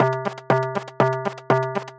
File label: Keys Machine; ohms-law; Current